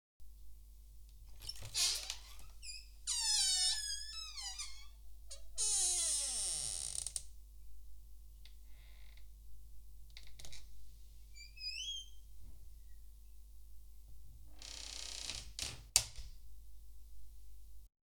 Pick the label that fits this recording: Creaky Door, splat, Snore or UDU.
Creaky Door